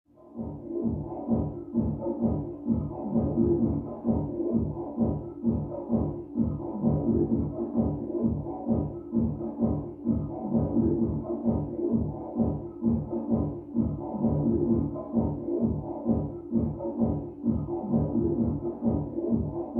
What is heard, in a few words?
Mx Electronic Dance